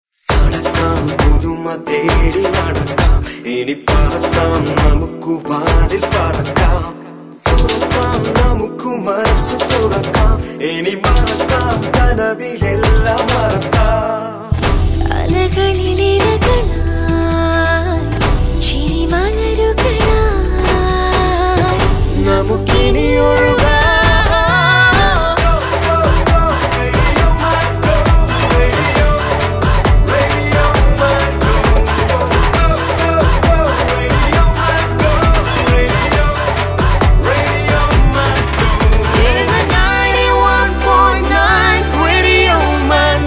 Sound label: Mango Bhangra